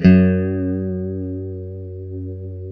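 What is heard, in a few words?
F#JC120; Guitars; Spectrasonics; Hans Zimmer Guitars VOL-1; JC-STRAT MF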